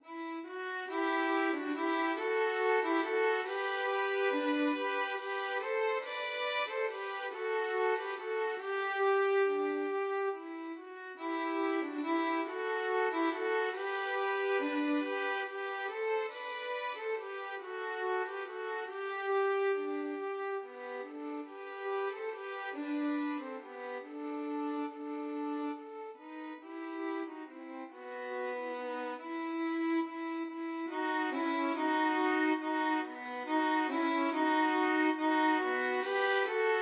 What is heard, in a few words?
respighi siciliana violin duet